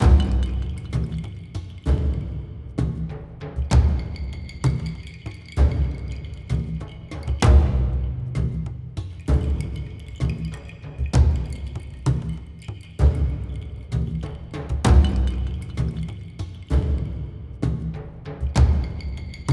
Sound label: ambience Music; templeofnudges